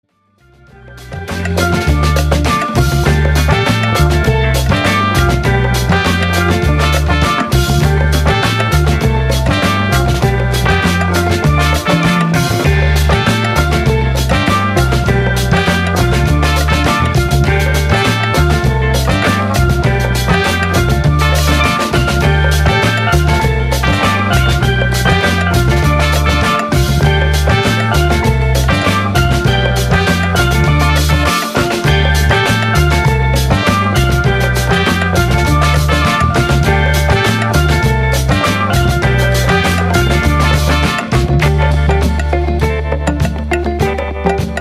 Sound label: Rhythm and Steel band